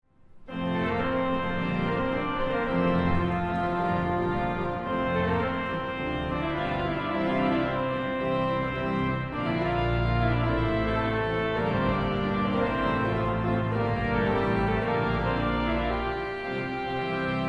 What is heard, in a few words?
Festive Trumpet Tune; David German